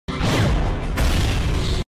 speaker blast; titan

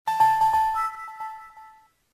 nintendo dsi boot

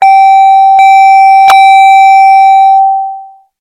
warning chime

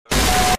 jumpscare sound

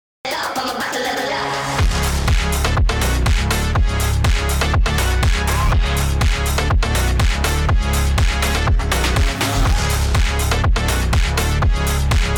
level up phonk

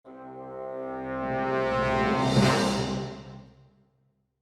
crescendo finale

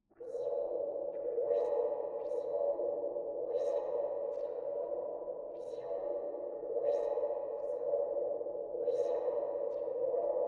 sci-fi alarm

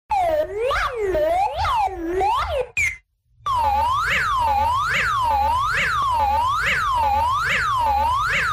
eas alarm; islande

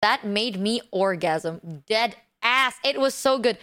huuuh

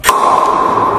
pop; idubbbz

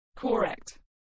correct

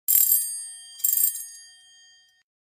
doorbell

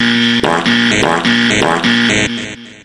buzzer fart

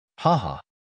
haha crab

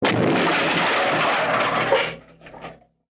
wobbly junk crash

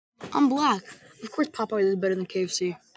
big long poop fart

